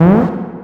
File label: ui open; rust